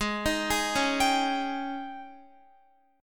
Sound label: Ab Major 7th Suspended 4th Ab down Guitar 4E 4A 5D 5G 5C 6F; AbM7sus4 arpeggiated